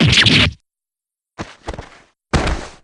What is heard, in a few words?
fall; simon